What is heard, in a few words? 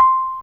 Kalim Hi C5, Fairlight CMI IIe, Mallets